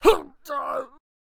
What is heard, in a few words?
Headbutter Death Vocals